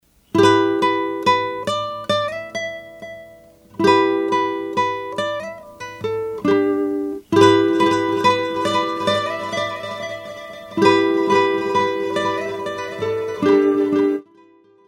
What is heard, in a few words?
multitap delay; orinj